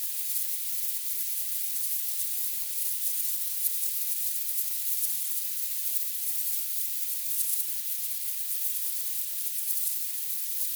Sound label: spray; grunk